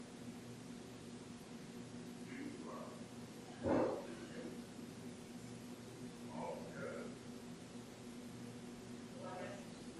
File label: Static; Room 4